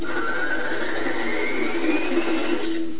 card swap; usa

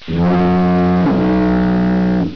times up

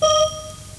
buzzer

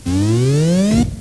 clock app; australia

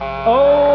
buzz

